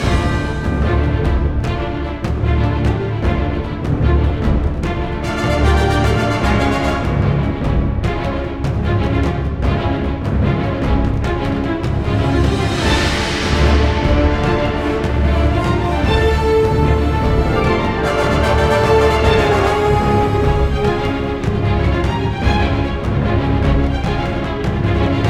Music Autumn Threat Very High Loop

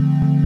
synth Loop

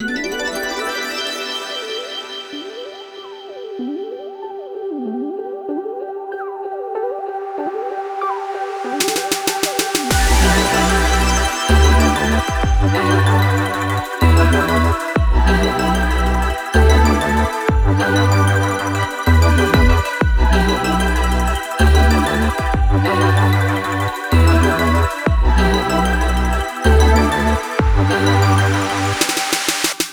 Music Forest; sayonara wild hearts 2019 gamerip

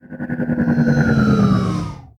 Ship Enter Level

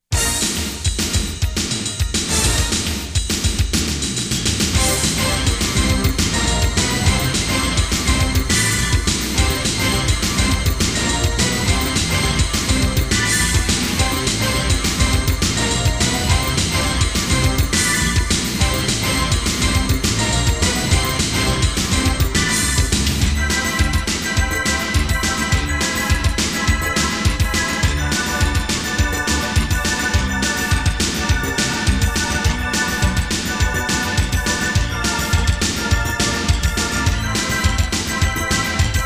Midi; Salamander Portable; PSP; Xexex

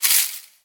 footstep foliage; Scrat

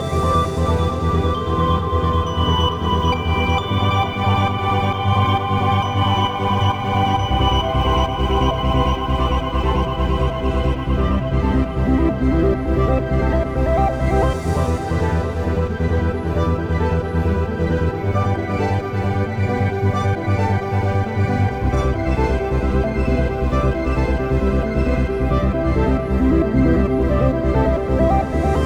Music Intro Menu; sayonara wild hearts 2019 gamerip; Lovers

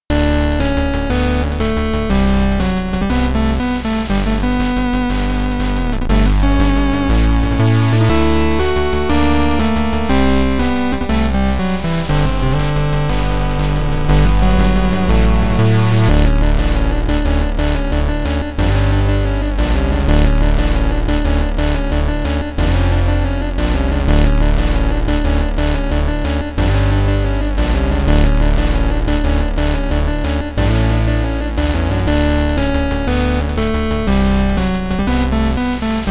Credits, Chiptune

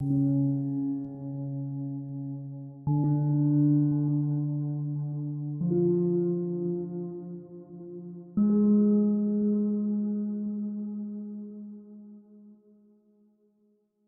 Music Storage Intro

